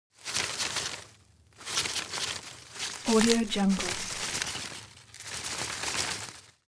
foley leaves rustle hard; smartsoundfx

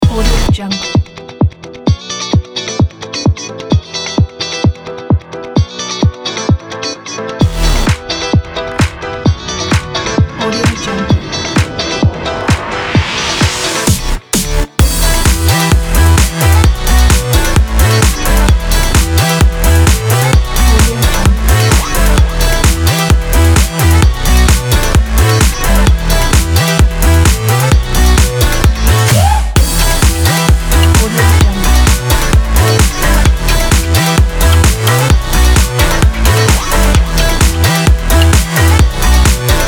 pop party sport

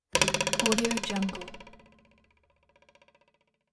spring vibration medium

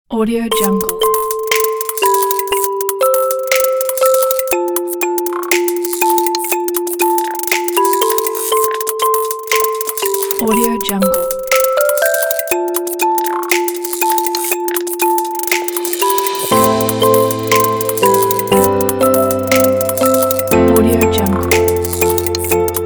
technology abstract

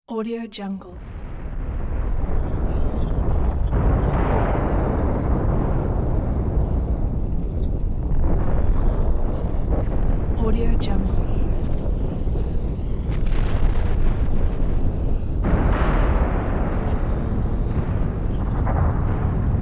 volcano rumble

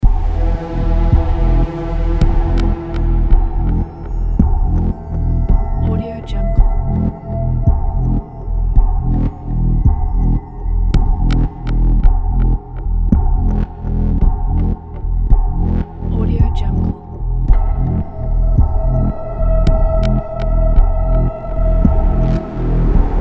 dark claustrophobic horror background

